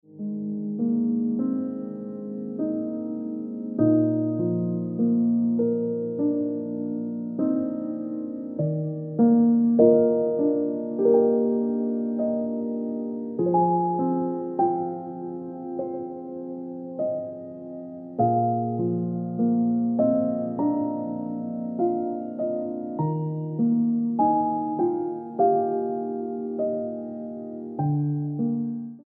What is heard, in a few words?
Ringtone Piano Music